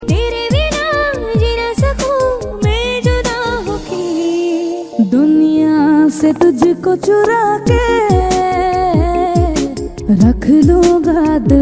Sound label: Song Tone